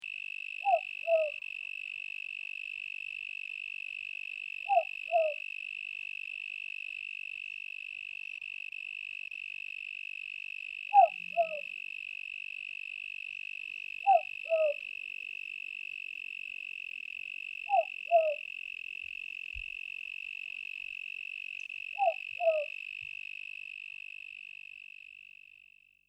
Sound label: owl, Kings canyon NT